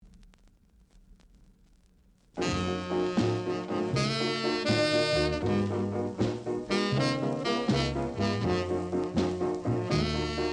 break

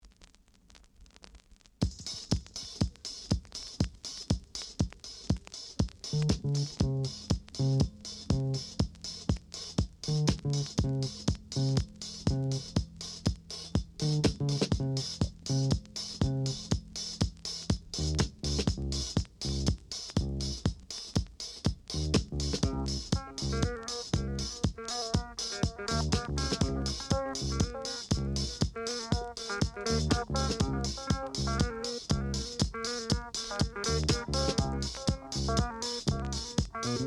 disco dub

